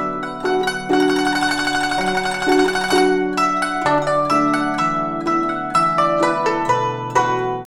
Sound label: Guzheng 124 bpm